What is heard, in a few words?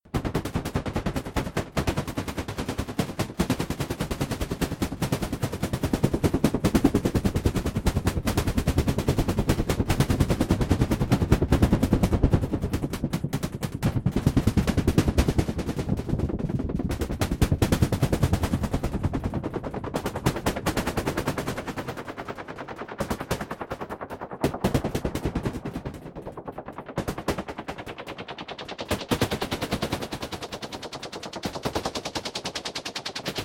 White Percussion Stereo